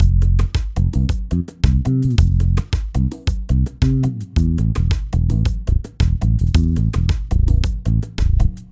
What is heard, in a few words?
Guitar Bass 6/8 Bastaki; bass-pack